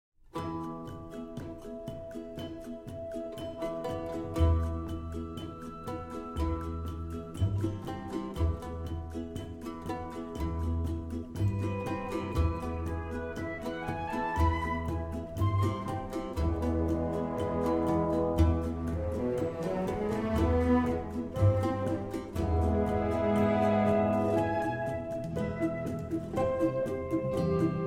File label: Easy Music